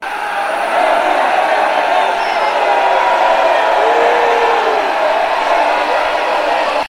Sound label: boo crowd